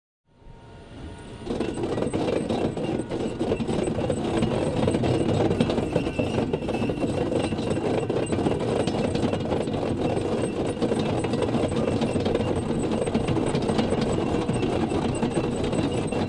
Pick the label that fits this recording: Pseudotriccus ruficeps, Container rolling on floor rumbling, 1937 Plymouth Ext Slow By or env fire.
Container rolling on floor rumbling